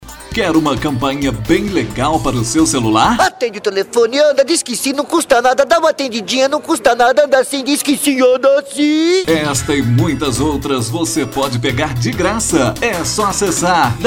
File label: RINGTONES